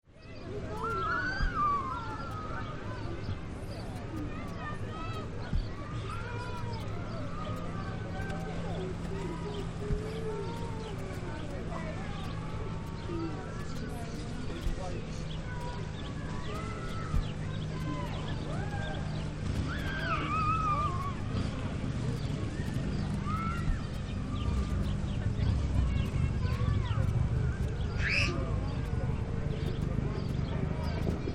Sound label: Children Playing in Park Long shot; Very background funfair music; Playground; Tom Hutchings